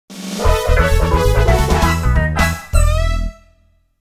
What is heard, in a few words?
Bonus Failed; bonanza bros turbografx 16 1992